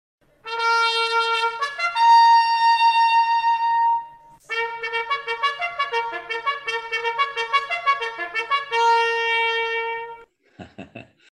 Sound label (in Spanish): toque de ensillar; conferencia cejas